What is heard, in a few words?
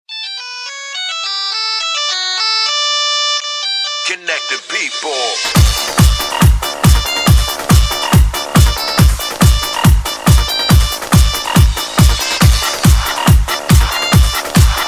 Nokia Remix